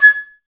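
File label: flute, Woodwinds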